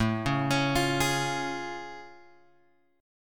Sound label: A Augmented Major 7th A; A+M7 Chord {5 4 X 6 6 4} arpeggiated